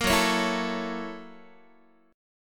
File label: F sharp 7th Sharp 9th, Guitar; F#7#9; strummed